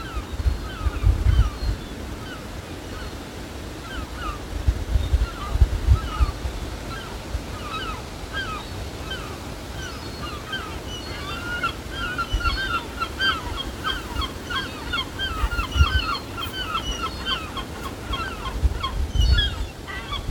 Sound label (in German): Moewe